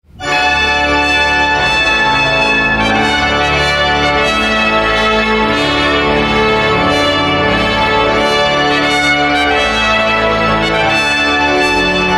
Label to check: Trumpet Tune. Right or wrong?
Right